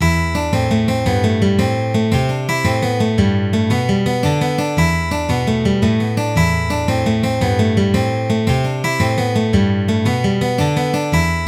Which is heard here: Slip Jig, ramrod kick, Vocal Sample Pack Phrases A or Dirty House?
Slip Jig